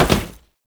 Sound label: Step Metal, Foley; Warframe; Oraxia